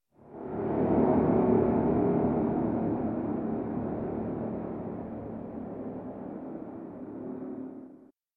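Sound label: slow-mo sound effect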